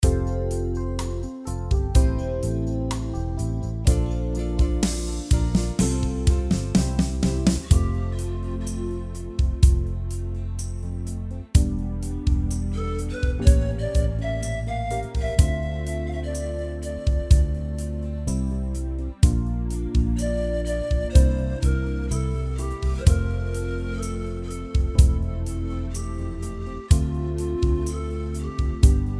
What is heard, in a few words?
Slow Beat; AiR Symphony